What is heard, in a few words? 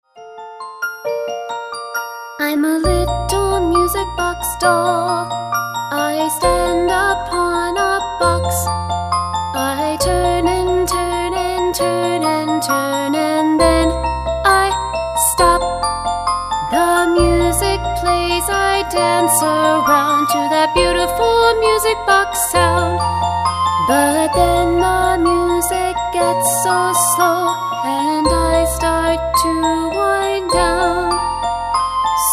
Music Box Doll